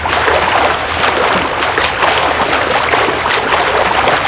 splash

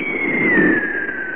missile

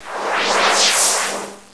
WIND

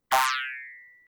Yippee!; Lemmings; MS-DOS Version

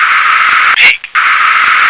pig

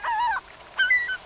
TWO GULL

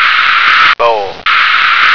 bowl

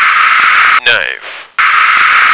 knife

